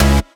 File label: Synth Cuts; Vengeance Samples; VES1 Instruments